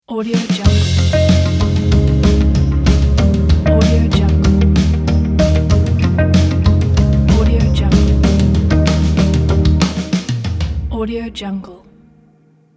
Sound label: britpop intro logo